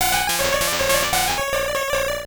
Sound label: cry jynx